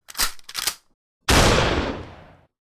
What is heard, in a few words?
Mossberg 590 load and shot